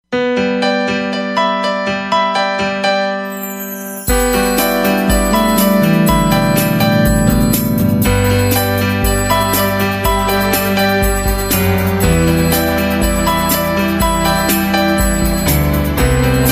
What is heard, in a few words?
iPhone Business, Iphone Ringtones